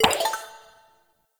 Messenger Window Open; Warframe; Ambience; Nineteen Ninety Nine

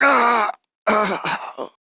Death, Corpus Male; Warframe; Modular Corpus; Battle Barks; en

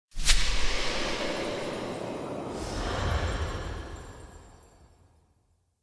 Warp Point Activate

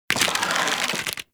Draw; Infested; Cernos

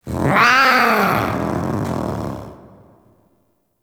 Iron Wake Growl; Warframe; Harrow; Rook

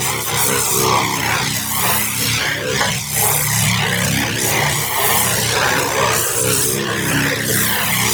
Space Fighter Elite Railgun Fire Loop; Warframe; RailJack; Corpus; Space Fighter Charge Agent; Weapons

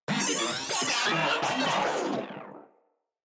Moa Vocal Death

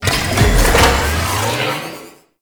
Rail Jack Ship Power Shield Activate; Warframe

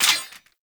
Sentient Sniper Mech; Warframe

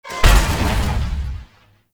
Piston Fall; Warframe; Eidolon Plains

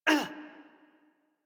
Generic Short Pain Operator Voice en; Warframe; Operator Mission

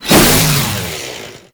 Chain Saw Tonfa Whoosh; Warframe; Grineer

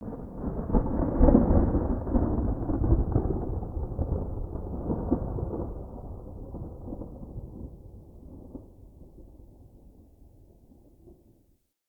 Night Rolling Thunder; Night One Offs; Ambience

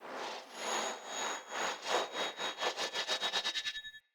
Throwing Knives Orbital Projectile Start

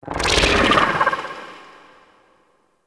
Cloak Deactivate; Enemies; Abilities; Warframe; Corpus

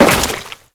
Crewman Step Goo, Footsteps; Warframe; Corpus

